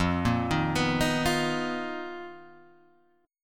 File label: B Major 7th Flat 5th F, chord shape